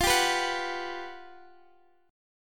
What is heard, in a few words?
G Major 7th, F; Listen to GM7/F strummed; GM7/F Chord